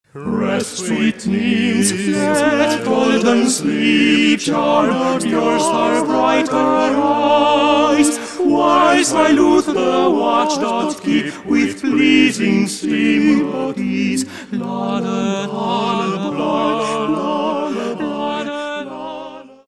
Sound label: Voice Quartet; Rest sweet nymphes